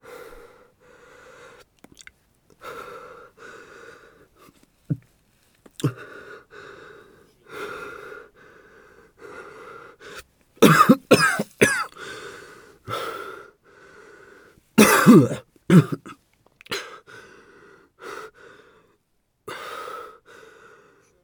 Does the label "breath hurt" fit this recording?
Yes